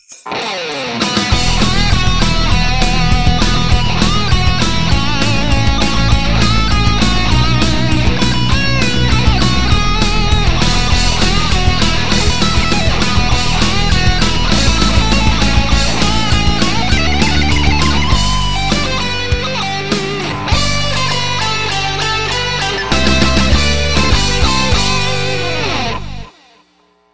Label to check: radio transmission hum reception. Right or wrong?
Wrong